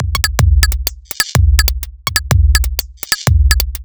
125bpm, House Drumloops

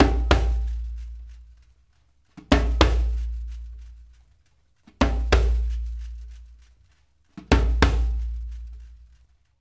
PRC HAND, Africa, Perc Loops; Roland LCDP14 Africa VOL-2

